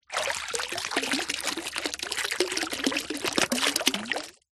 bottle plastic small pull out deep water pour water out into water; foley; zapsplat